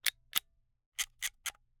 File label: Camera, Kinusa K77, 50mm lens, plastic, snapshot, wind film; Eiravaein Works; Vaeyan IV